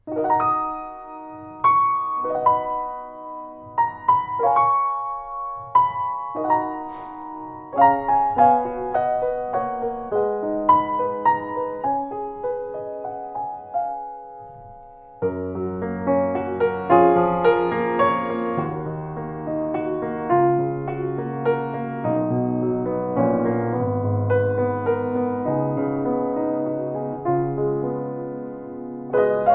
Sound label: C. Bechstein M, piano